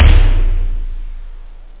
tom; toms

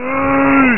APPEAR; World Of A1200; zombieii; zombies

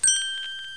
Pingy; Bible Fun Pack; Education Publishing Concepts

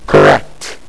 Correct; Lab-Rat v2.0

